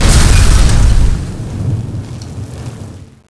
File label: crash, comanche; nst monster